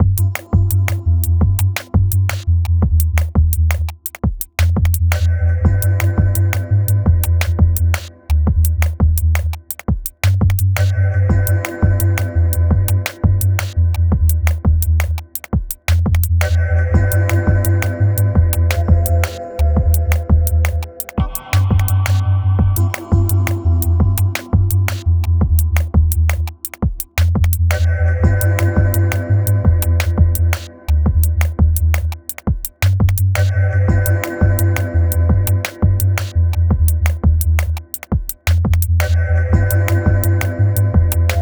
salsa in dub, samba remix

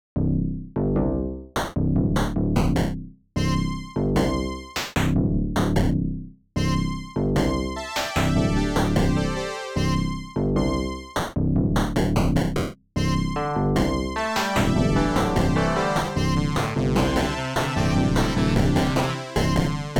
disco; assembly summer22; fast music

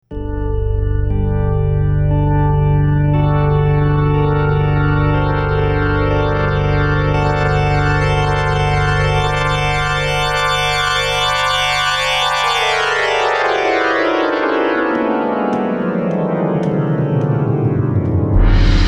organ etude; enough records

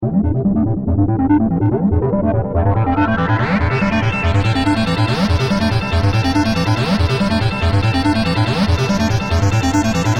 synthetic frog

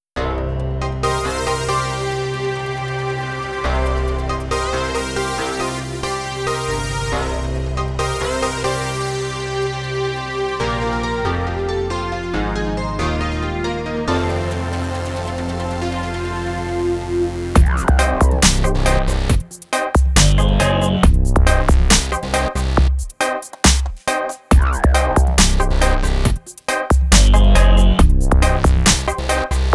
reggae; assembly17

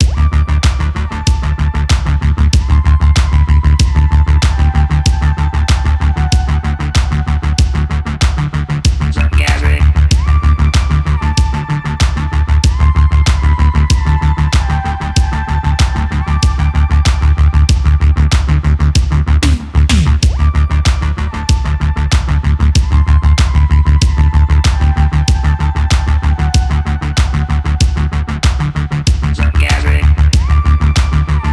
dun dun dun; thegathering18; looped soundtrack

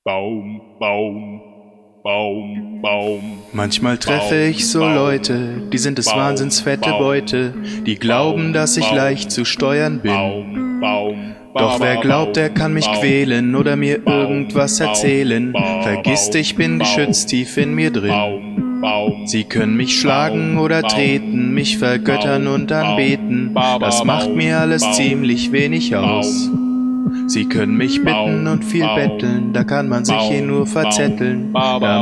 acapella; evoke03

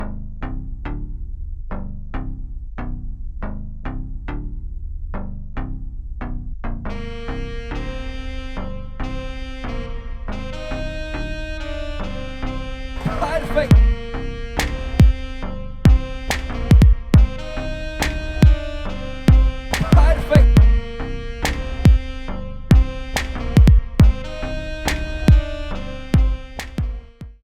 jazzy; thegathering18; fast music

